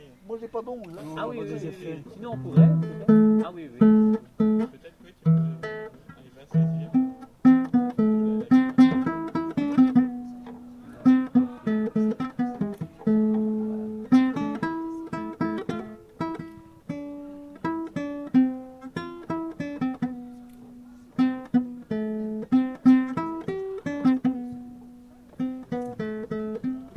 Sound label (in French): Un peu de guitare